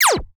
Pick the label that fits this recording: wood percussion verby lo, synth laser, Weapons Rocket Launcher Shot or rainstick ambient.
synth laser